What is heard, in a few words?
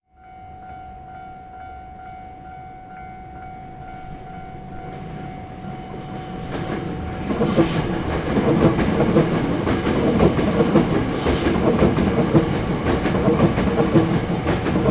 Train, Electric, Pass; City Sounds